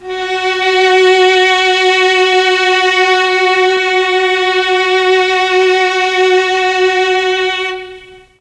F#2 VLNS, Mellotron; Mike Pinder - Mellotron Archive CD

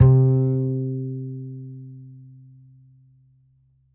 A, upright acoustic bass, A string

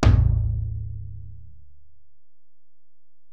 Tom, Alesis DM-PRO

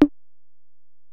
Tom, Alesis DM-PRO, Drum Machines, Toms

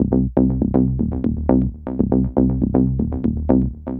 Skip Bass Delay; Sonic Foundry (Sony Creative Software); The Electronic Music Manuscript Collection; Bass Foundations

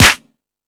Dance, Drum Machines

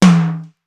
SDS2000 HT, Simmons SDS2000; SDS2000 Factory7

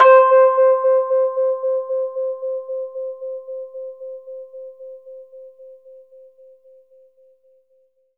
Rhodes, 3D Keys; 3D Sound; RHODES FAST HD

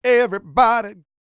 SING, Vocal Planet R&B & Dance; ILIO

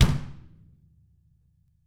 DW FELT, KICK

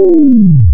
Bell Tree Fall Lower, wavetables; Animoog

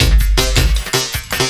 LOOP, 160 BPM, Drum & Bass

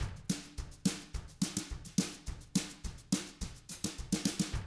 TRAIN, Double Platinum Drums; ILIO; Double Platinum Drums 1

